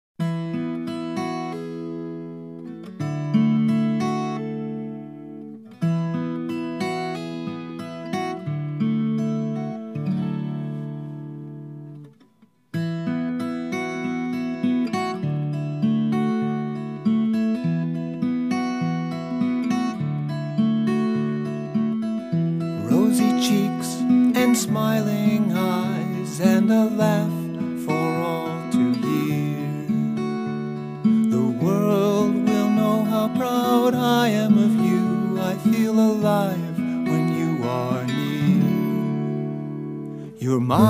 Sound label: Lullaby